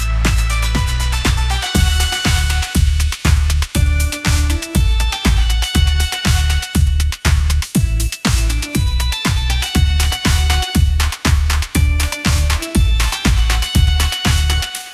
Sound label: upbeat pop melody, ringtones